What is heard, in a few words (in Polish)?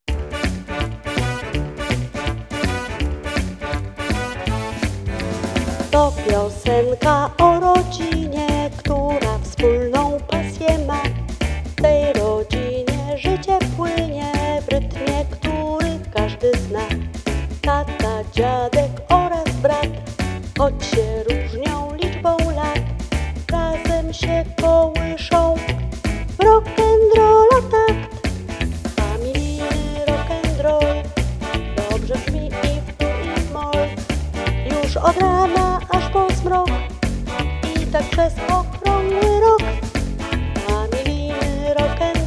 rock and roll